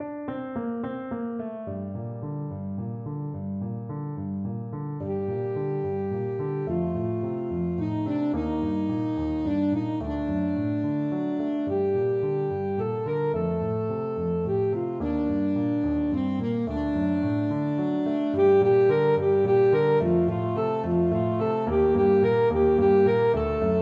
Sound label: Spanish violin